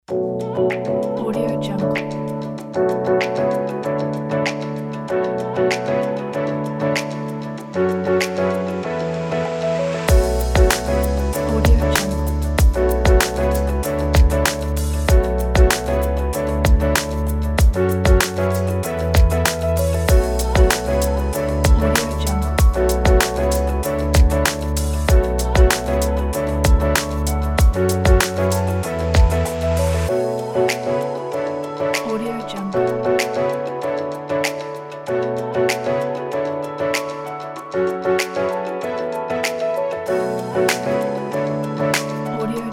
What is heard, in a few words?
vlog hiphop